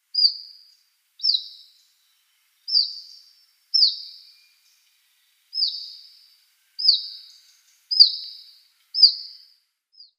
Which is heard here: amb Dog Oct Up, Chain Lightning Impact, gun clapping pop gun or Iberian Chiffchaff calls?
Iberian Chiffchaff calls